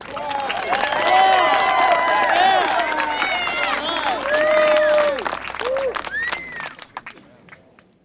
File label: CROWD; PC Gamer 49